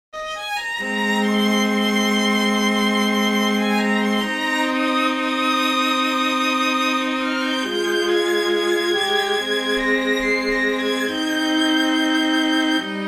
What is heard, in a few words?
string quartet